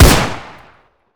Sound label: new ump45; garrysmod